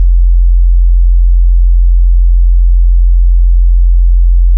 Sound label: Single Electric Basses, Dance Floor Bass